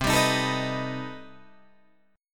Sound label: C Minor Major 13th C down Guitar DADGAD